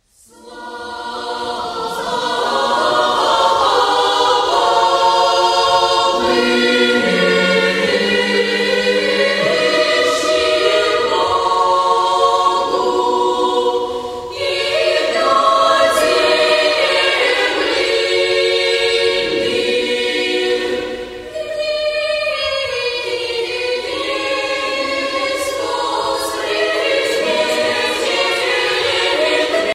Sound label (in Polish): Chór Dziecięcej; SŁAWA W WYSZNICH BOGU; Anatolij Ladow; Starszy Koncertowy; Kapelly Chóralnej